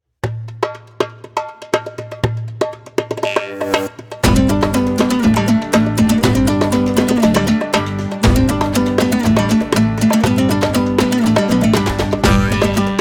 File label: neo ethno folk group